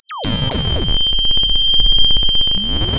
pop

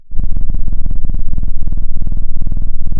pop, Sound of a cat purring

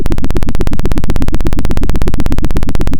Sound of a helicopter

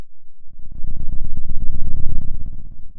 Sound of a dinosaur

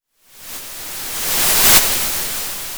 Sound of waves crashing on a shore

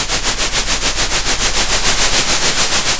center, Sound of a train

